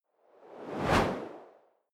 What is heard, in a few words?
whoosh simple